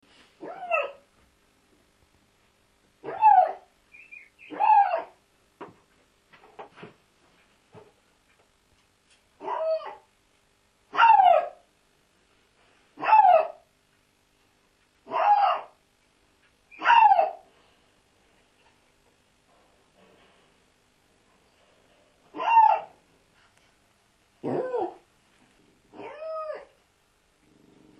Bobcat

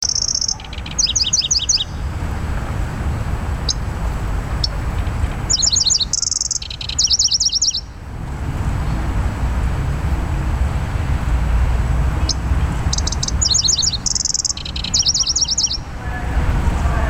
L certh song

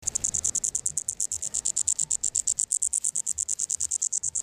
Cicadetta yessoensis

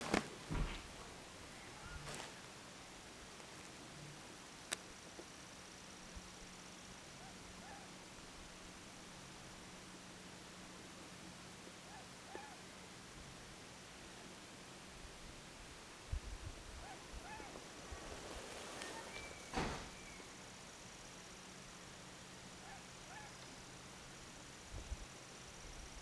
Asio otus chicks

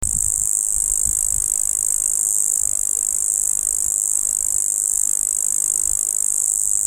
Gampsocleis sedakovi male song

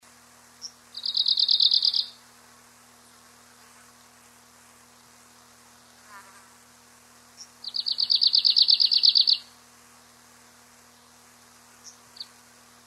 Phylloscopus borealis

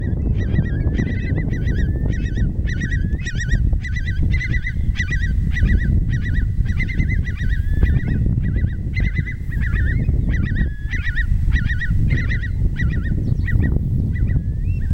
N arquata worrying calls

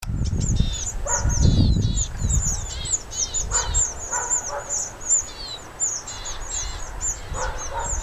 Parus montanus flock

